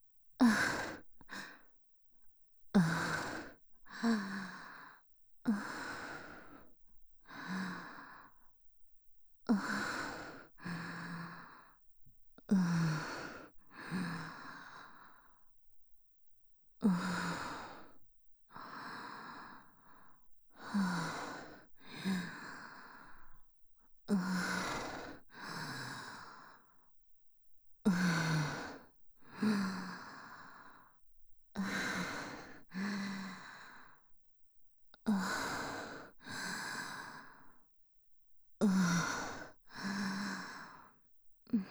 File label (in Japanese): 吐息